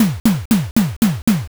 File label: rush